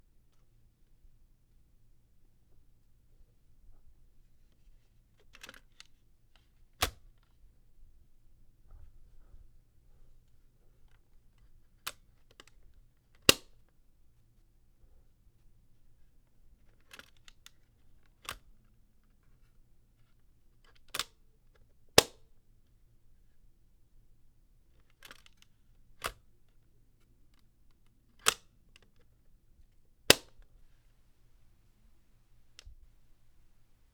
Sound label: Cassette deck open close